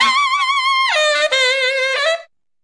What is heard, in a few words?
sax squeal; instruments single samples; horns and brass